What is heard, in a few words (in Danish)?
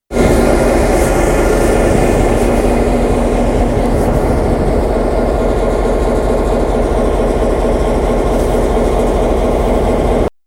Mx motorlyd, MX tomgang; Mjba Mx26; 11. april 2025, Langå St.; Silas Lang